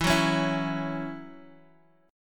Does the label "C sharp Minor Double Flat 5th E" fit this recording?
Yes